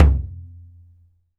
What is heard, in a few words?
MADAL, DRUMS OF IND